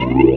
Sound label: Korg, FX